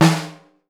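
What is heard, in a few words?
BRAZIL piccolo, Percussion; Voice Crystal - Steve Reid Definitive Percussion; BRAZIL SET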